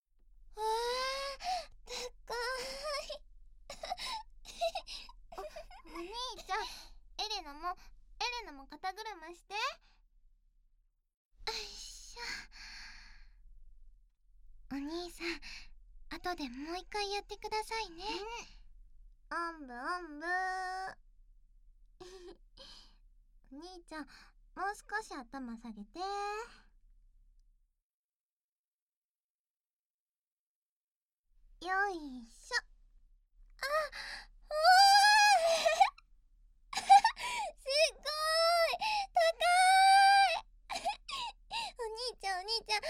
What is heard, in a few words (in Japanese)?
散髪と耳舐め 効果音なし